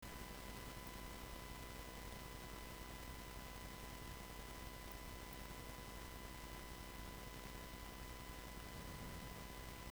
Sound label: noise